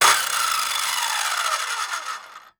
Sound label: Circular Saw; Tools